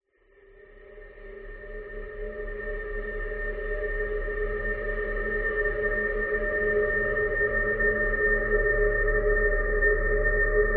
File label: science fiction atmosphere environment dark eerie desolate howling whine sinister; zapsplat